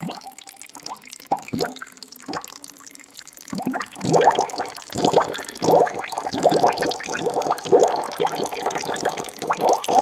hollow water gurgling in a pipe

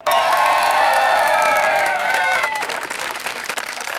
small crowd cheering and applauding

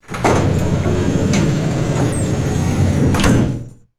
heavy freight elevator door sliding shut; machines

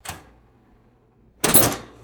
two light toaster handle clicks; mechanical

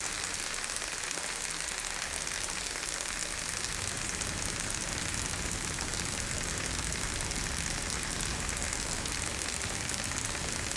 steady rain pattering on a roof

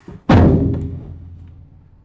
strong hollow wooden impact thud

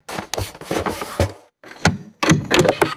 vacuum cleaner handled and set down; machines

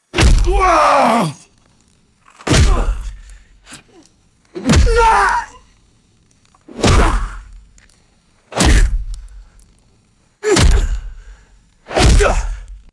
four heavy fleshy punches with grunts

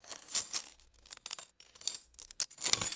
metal chain dog leash picked up

